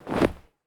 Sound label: Bodyfall Mixed